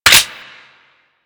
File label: Lever Action Pistol Reload; Grineer